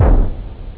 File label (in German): klapp, drums techno; OctaMED SoundStudio V1